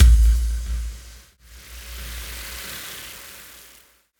Impact; impact samples

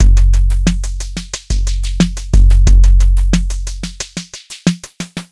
Noise Beat; Drums & Drones

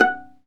STR VLA PI, Chamber Pizz, String Master Series; Roland - String Master Series

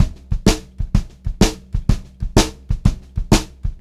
VARI, Pop-Rock Drumloops

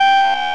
contact, signals; AKAI; FX SIGNALS

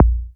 MINIM BD; DANCE KIT

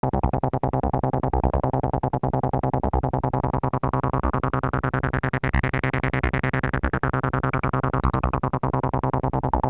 Knob, Industrial

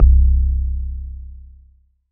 Tempest Kick, Drum Machines; 300 Drum Machines; Dave Smith Instruments

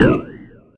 Oneshot; Sonic Foundry (Sony Creative Software); Esoterik Beatz; Messup

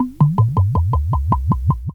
WHISKY, KITCHEN; AKAI S-Series CD-ROM Sound Library VOL-6

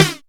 Flange Snares

